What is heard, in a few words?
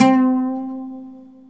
OUD C 4